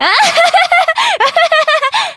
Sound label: nervous laugh